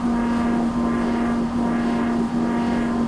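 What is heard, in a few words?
elevator interior loop; doors elevators; Star Wars Obi-Wan; XBOX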